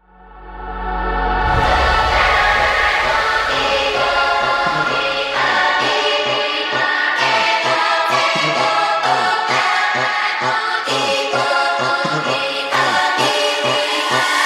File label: Bulgarian funk